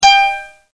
TIING

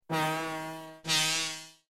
horn

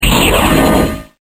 scroll

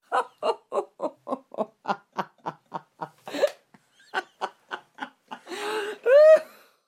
Laugh Female

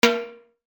TIMBALE

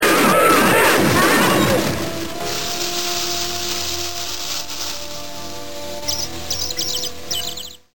zzap

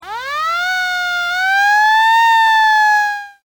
fire truck siren

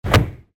car door shut

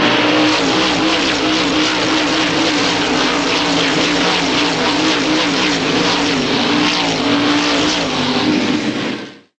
RACE CAR

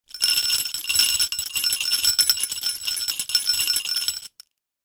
jingle bell

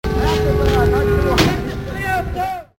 FireMan

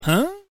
huhh

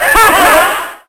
LAUGHING

